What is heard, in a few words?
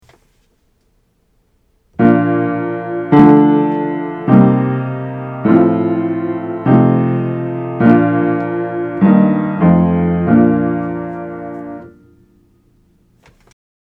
Triads in Inversion; Harmonizing; Aural Training exercise